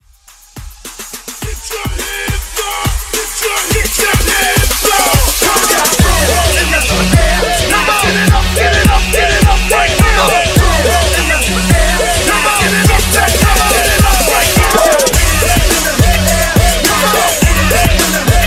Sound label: Party Breakz Dirty 105 BPM